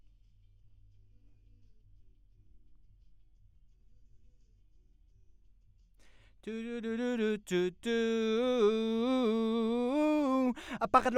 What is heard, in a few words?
VOX